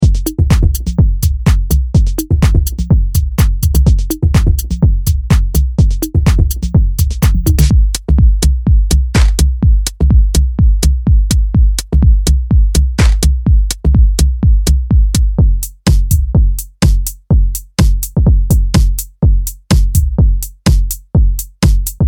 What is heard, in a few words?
Analog Drum Hits